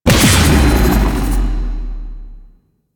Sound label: Howl Damage; Warframe; Voruna